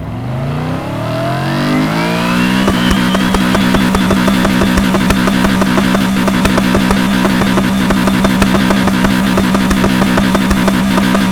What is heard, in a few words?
rev; vehicles; nfs heat ganimator pack